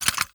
contents, ammo clip rapidfire

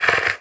breath in, headcrab

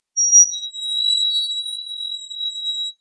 equipment stress

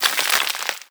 debris dirt, bullet impact debris

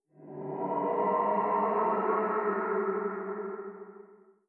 idle moan wet, zombie vox; creatures

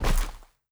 body impact soft

